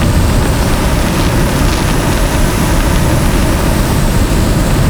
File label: Jetpack Run Loop; Warframe; Vehicles; Veilbreaker